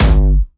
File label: Jumpstyle Kick G1, vengeance essential bassdrums; vengeance essential club sounds-3; VEC3 Bassdrums